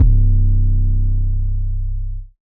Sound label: 808 flute song C; 808s